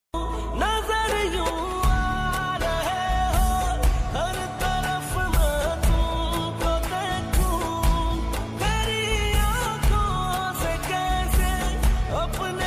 pakistani ringtone

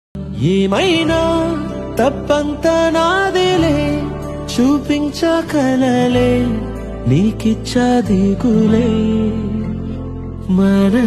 telugu ringtone

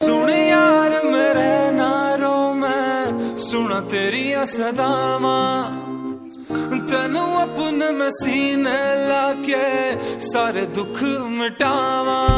pakistani ringtone

